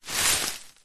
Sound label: foley wood chippings handful pick up; zapsplat